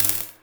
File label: Laser Skin Printer Laser Stop; Warframe; Ambience; Entrati